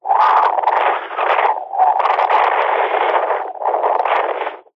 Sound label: technology cb radio wind blowing receiver speaker pov